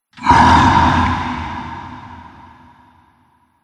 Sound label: Zombie Gnarl; Zomby; Horror